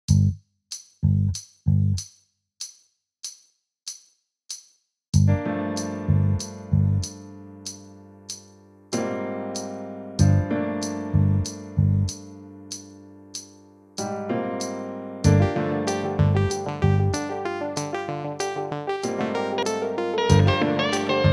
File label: Jazz and bass